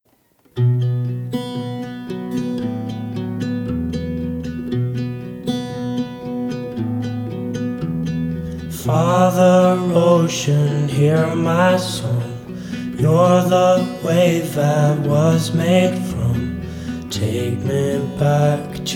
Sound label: Acoustic Version